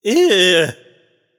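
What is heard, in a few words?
nonverbal ugh; smite; voicepack; merlin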